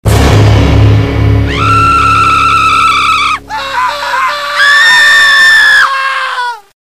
gritos sms

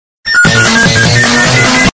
toque alto estridente

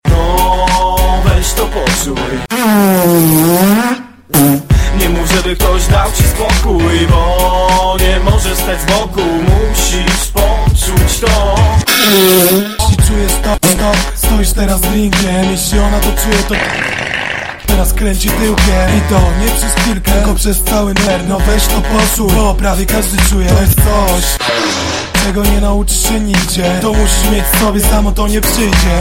Peidos Mix

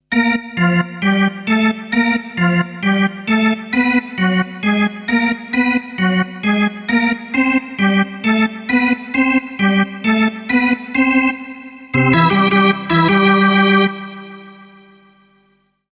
hockey musica de intervalo

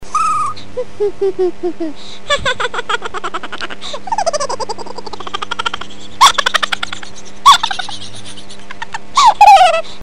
risadinha do bebe ironica; toques para celular

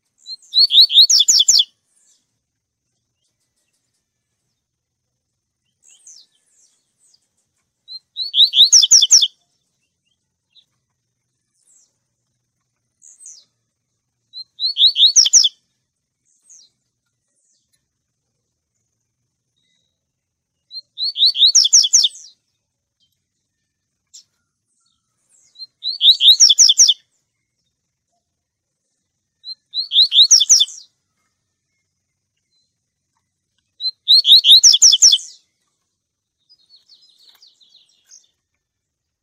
canto do coleiro tui tui

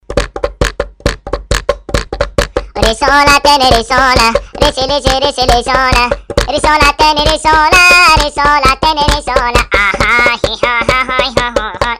Funny Arabe; toques para celular

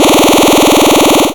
arma espacial

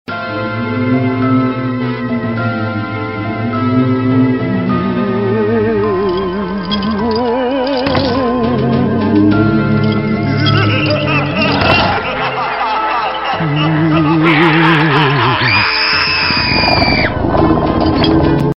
sons de fantasmas ringtone

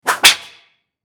chicote batendo

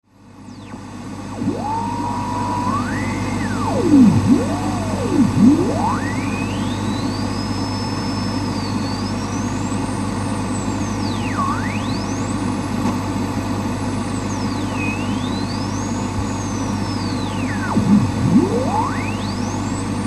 ruido de radio; efeito sonoro

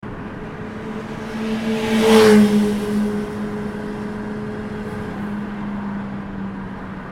moto passando; efeito sonoro

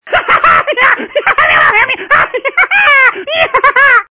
Paraiba Rindo; liga pra você

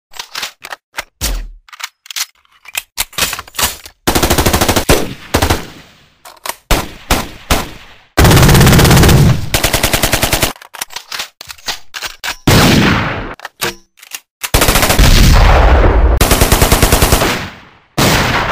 sequencia de tiros

